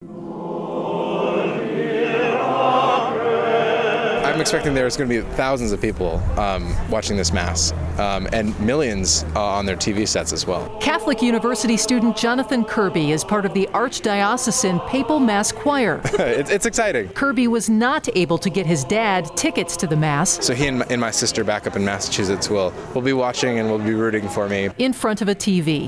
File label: papal choir